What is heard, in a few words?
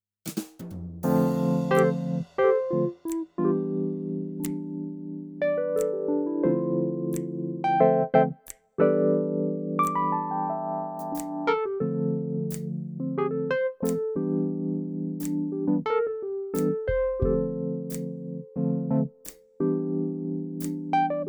Wurl